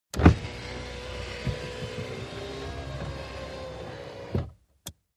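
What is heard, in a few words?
Electric Window, windows; accessories windows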